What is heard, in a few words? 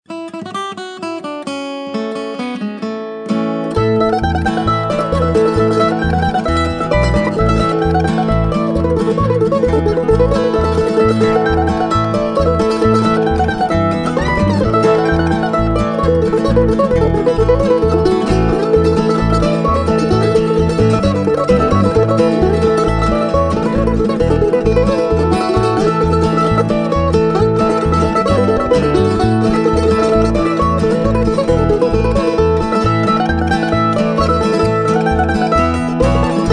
old joe clarck, mandoline